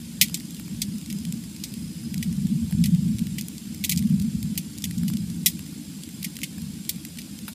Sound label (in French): feu mix